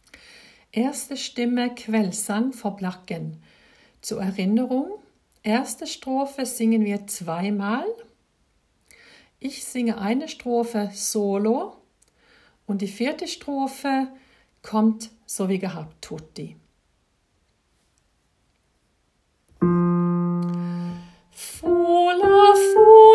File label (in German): 1. Stimme; Kveldssang for Blakken